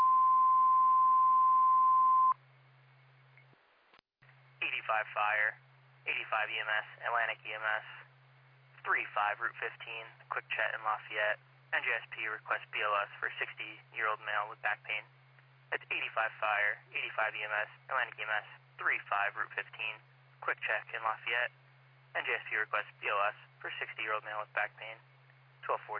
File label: Siren; Lafayette FD